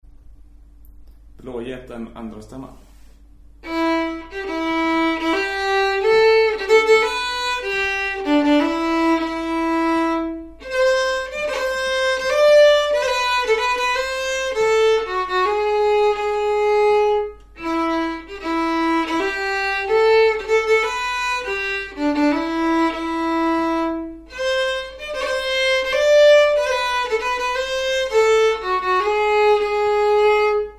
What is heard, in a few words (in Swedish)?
stämma; blågeten